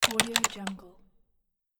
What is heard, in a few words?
mechanical keyboard key multiple